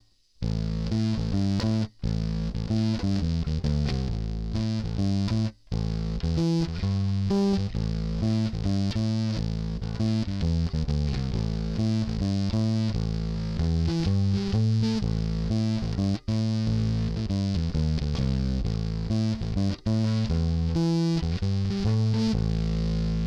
BASS Sweet Honey, On bass, in a split configuration, as an effect